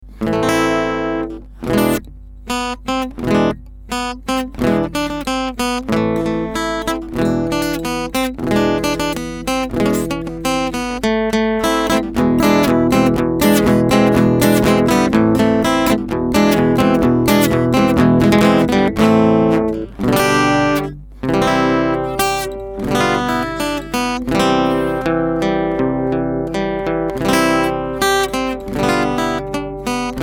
guitar

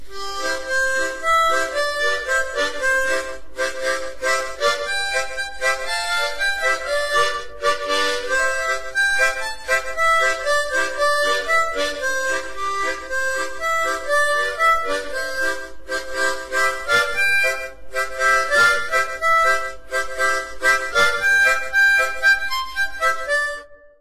harmonica

